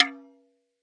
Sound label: Processed Ethn Perc, Doru Malaia Percussion